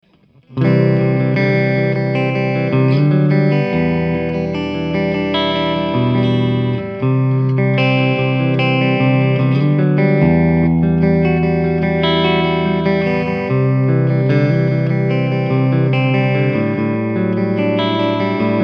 Clean

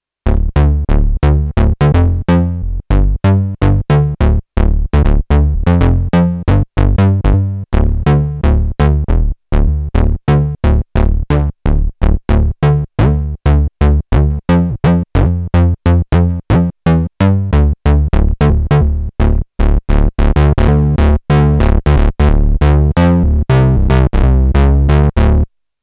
Bass

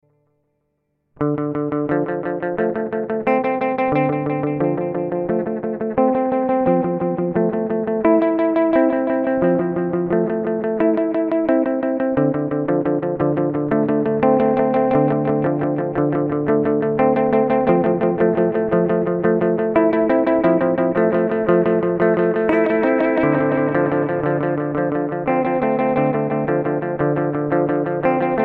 volante tape

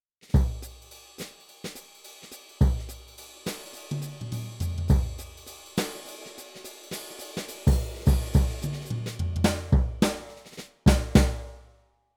Millenium MPS450 Jazzbrush

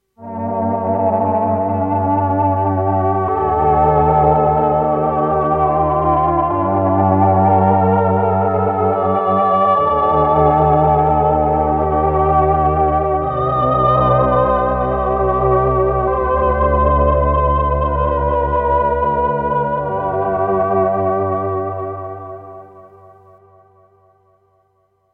Voice

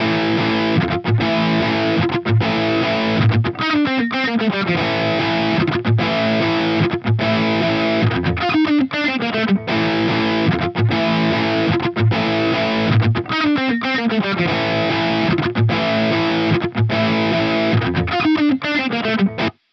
Mesa Boogie Dual Rectifier; Audiovergleich Mooer Nux

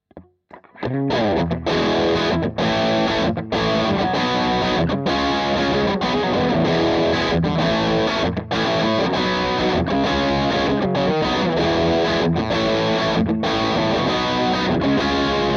Low Gain Riff

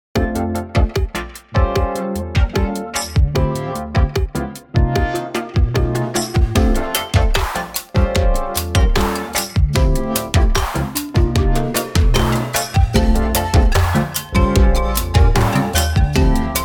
Nonlinear Labs C15 Latin

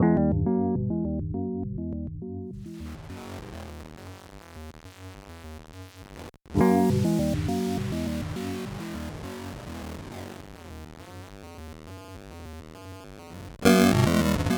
Pitch Sequencer; Ottobit

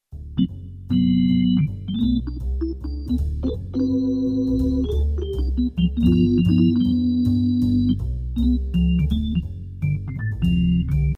Casio Privia PX-5S organ

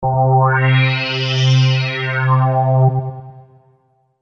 K5k Ld Spect Sweep

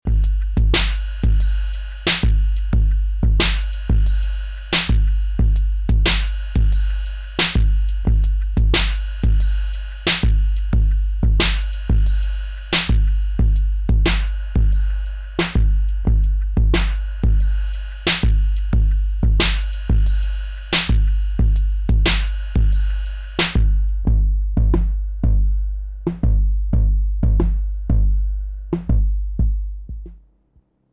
spring filter 808 Beat DRIVE; Snazzy FX the eternal; full CV CUT open

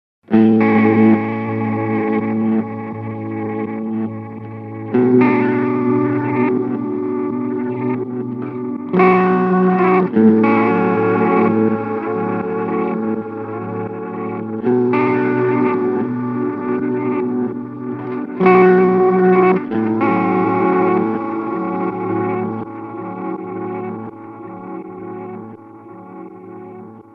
Rev Tape; Meris LVX Modular Delay System

